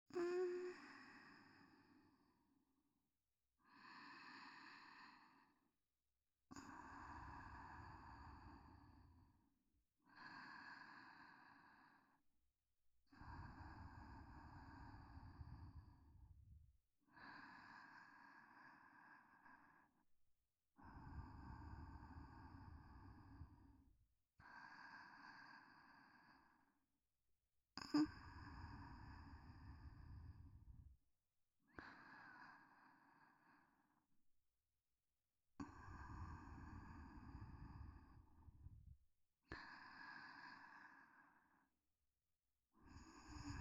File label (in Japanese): 寝息; SEなし